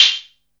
OPEN HH; USB Soundscan vol.51; AKAI

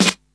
SD, Dance Drums

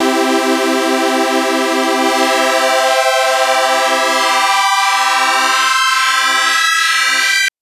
Synth SyncSweepPad maj c3; TrashLibrary

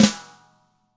DW FLM1 FD-L, DRUMS SNRD; ILIO; Double Platinum Drums 2

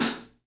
3D Drums, TIGHT ZILDJ; 3D Sound

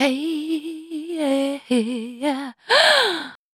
VOC F, Dance Vocals, FEMALE

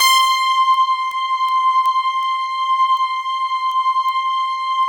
C5 FM, Synth Line; 90 s Sample CDs; NiceBeats; SynthLine VOL-20

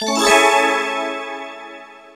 FX HEAVE; AMG - Now CD-ROM (Roland); B.2.B. Fun

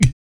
VOC PERC, Vocal Planet Jazz FX BASS PERC

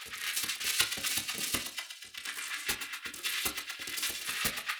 Cracklature, sparse soundscape

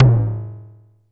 MID TOMS, DRUMS; Music Store Sound Service; Einstein

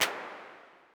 Clap Reverb, Vermona DRM1-MK2

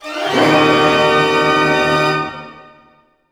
GLISS A MAJ; Sound On Sound - Peter Siedleczek's Orchestra

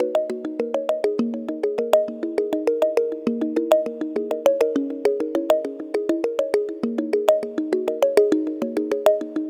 Tongue Mallet Echo; Melodic; Ambient-Melodics-II

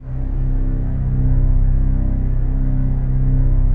PAD, DIGI-PADS

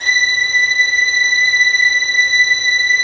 Violins, Arco; Arco Violins III

